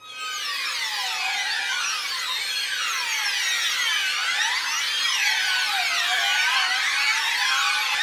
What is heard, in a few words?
STRING GLISS; VLS; Roland - LA Composer vol. 1